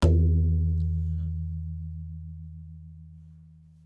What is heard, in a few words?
tabla; bahia; Dirt-Samples